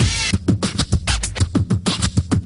digitalz, Beatbox, Drum loops

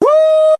woo

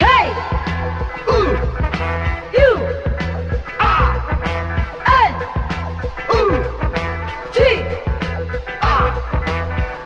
full, Loops Phonky

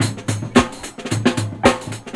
happy pop, funky drum loops

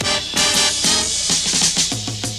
horns, loops

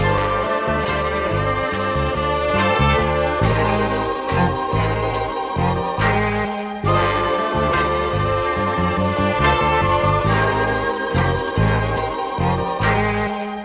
strings, Phonky loops

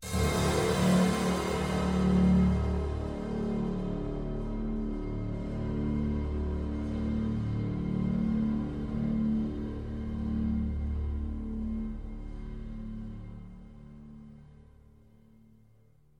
keys, Sad Loops

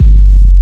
kick, Heartbeats

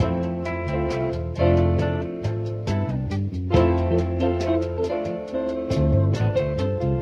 guitar, Phonky loops

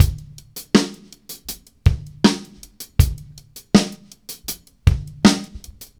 Drum loops Boombap

